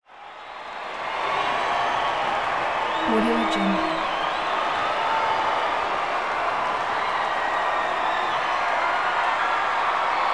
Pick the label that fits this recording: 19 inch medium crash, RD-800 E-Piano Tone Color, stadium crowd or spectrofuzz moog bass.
stadium crowd